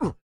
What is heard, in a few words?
Hurt; Joblin